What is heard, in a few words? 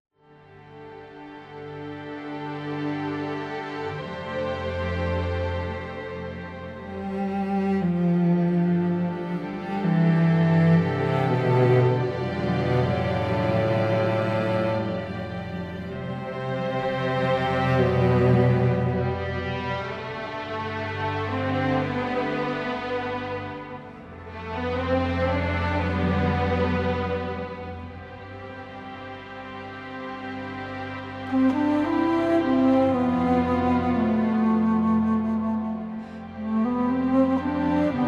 Weep Flute